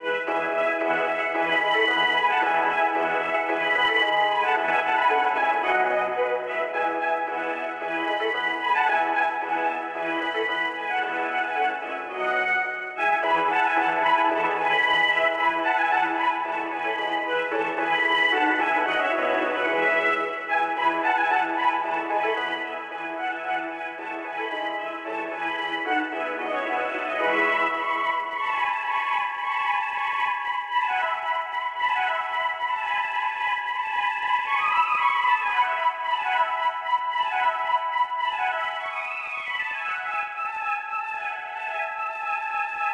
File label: Tuba